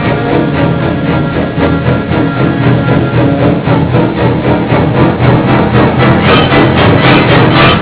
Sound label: jaws